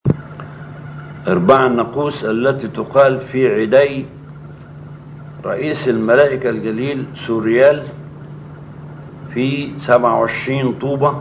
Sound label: Verses of Cymbals; Alhan Maleyka; Angel Souriel